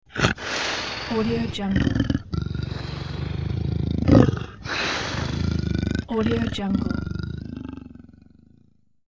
leopard snarl raspy

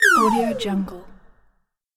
scifi laser shot

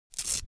velcro open cap quick; foley; smartsoundfx

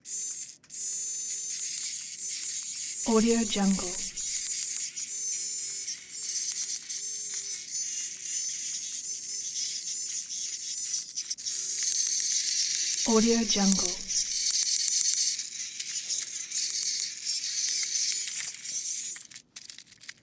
servo robot mini toy two leg spinner; soundmorph

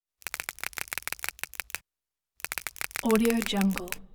giant insect clicks rapid bursts hard ticking

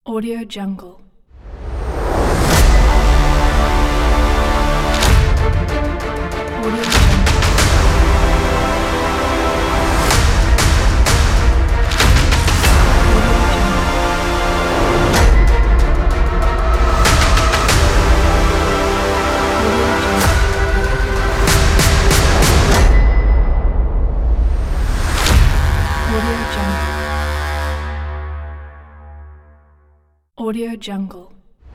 epic motivational trailer ident

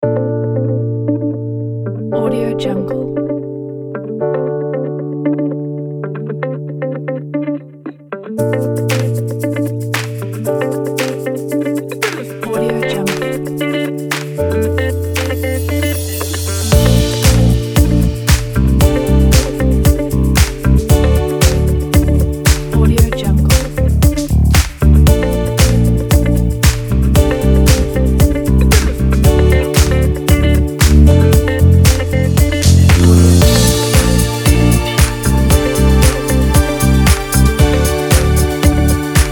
fashion show

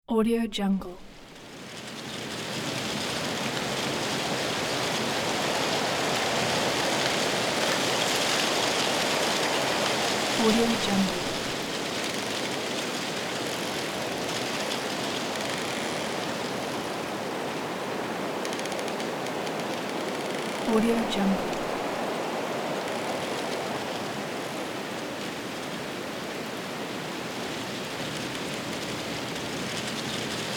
strong gust wind

